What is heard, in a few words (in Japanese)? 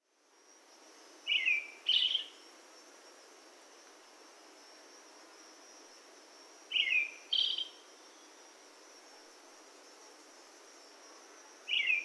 アカハラ さえずり; yaita